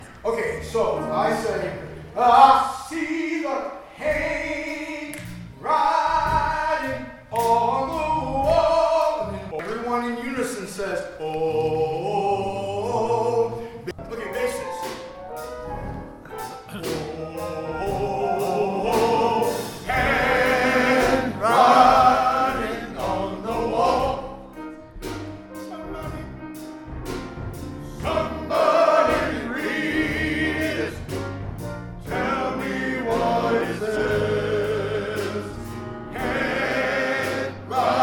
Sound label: Bass